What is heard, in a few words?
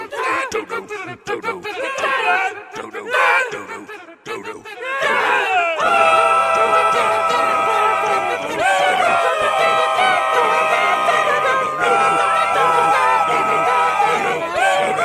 lightsaber fight